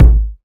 smooth bottom end steel kick drum sound a key, kick one shot